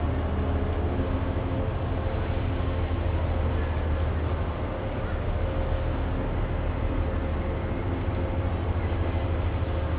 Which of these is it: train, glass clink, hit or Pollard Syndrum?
train